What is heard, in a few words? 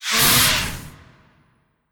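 Animal Death voice; legend of keepers gamerip 2019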